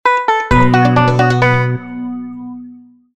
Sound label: popcorn